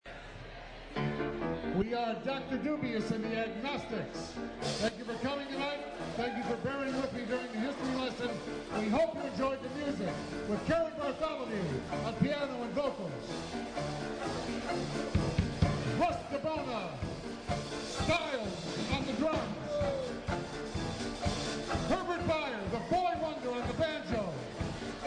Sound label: Dixieland